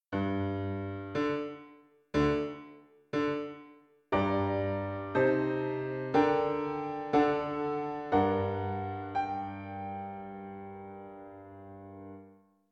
Piano

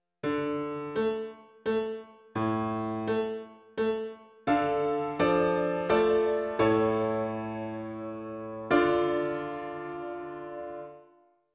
Piano

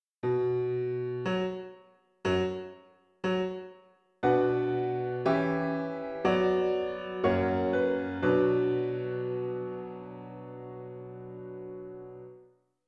Piano

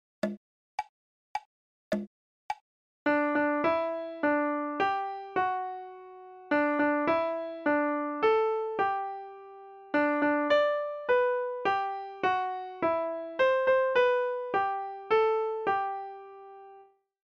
Happy Birthday right

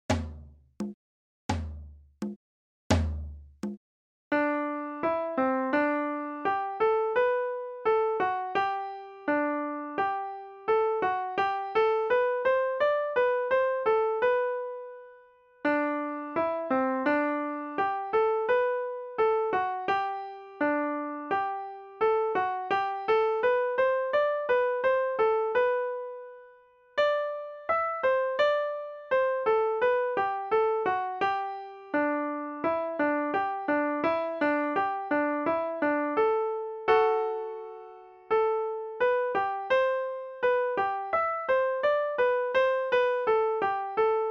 Musette Eng Suite right

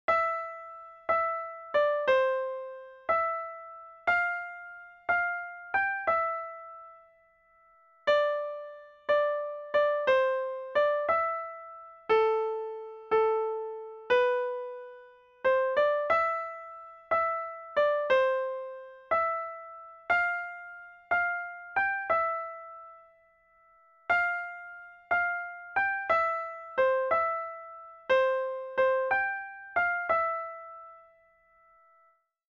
Over River Duet

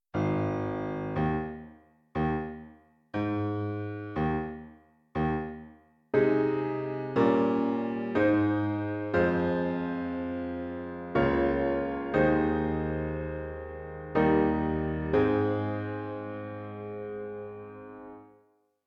Piano

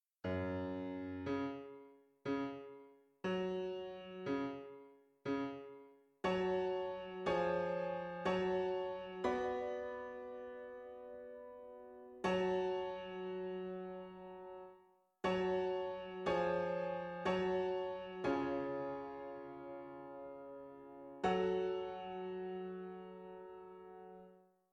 Piano

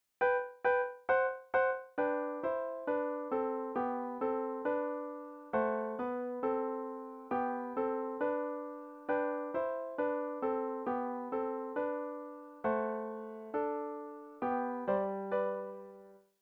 Duet; London Bridge

